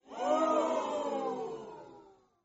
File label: Ooooh